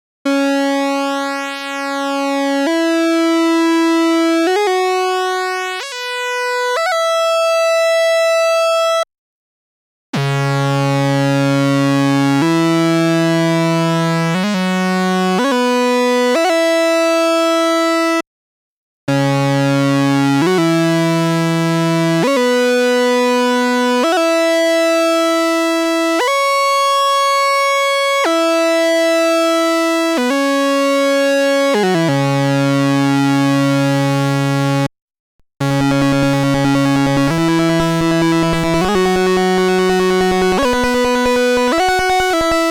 Moog Sub25 Wavemodulation PWM PWM Sub Saw to Pulse S H Audiorate Lead